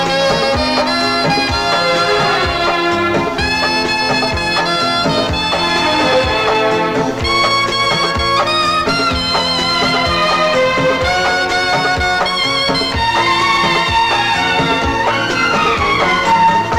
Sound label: Instrumental Ring